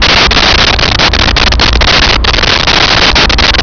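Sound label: ambience refinery loop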